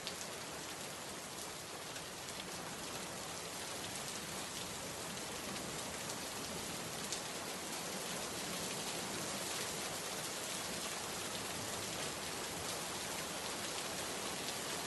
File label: Rain Sounds